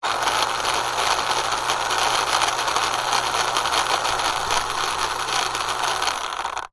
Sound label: science fiction robot small rattly tracks wheels motor whirr spin; zapsplat